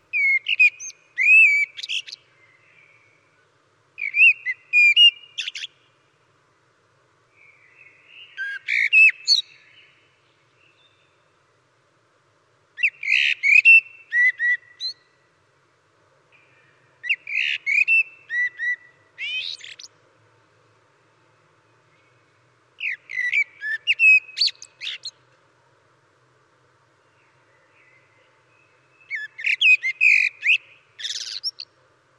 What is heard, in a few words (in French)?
merle, oiseaux